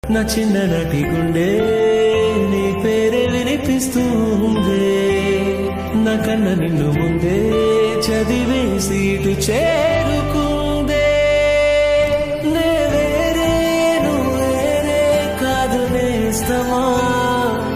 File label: song, telugu